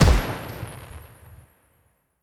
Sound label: Result Fireworks; Pac-Man Championship Edition 2 Plus